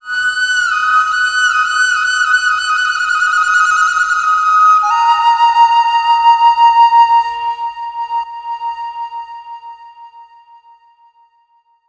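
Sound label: CH FLUTE, Chinese flute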